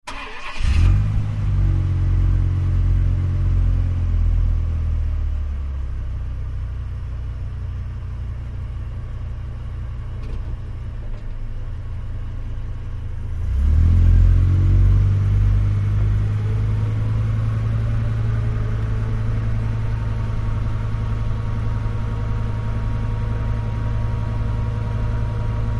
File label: Dodge Ram: On Board Slow Driving, Idle And Off. Mic Tail Pipe And Engine.